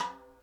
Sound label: Rim Snare